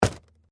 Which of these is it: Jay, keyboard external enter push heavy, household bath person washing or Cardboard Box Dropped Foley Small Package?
Cardboard Box Dropped Foley Small Package